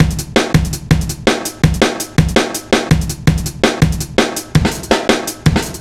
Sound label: 165 jackhammer, Drum Loops; Drum & Bass; Total Drum&Bass